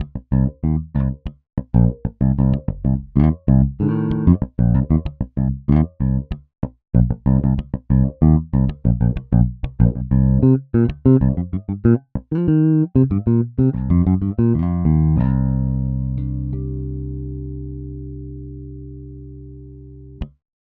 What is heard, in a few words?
Articulation Medley Vintage Finger DI; Virtual E-Bass; Toontrack EZbass; Songwriting Tool